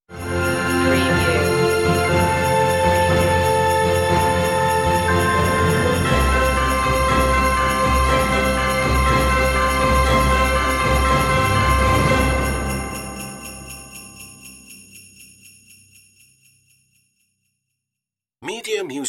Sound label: no vocal; media music now